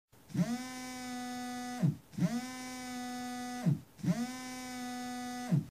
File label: vibration